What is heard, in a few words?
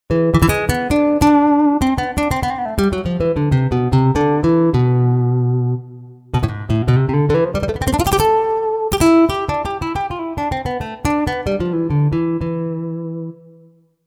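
Spain guitar; TURBO VL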